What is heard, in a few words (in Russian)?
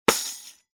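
один удар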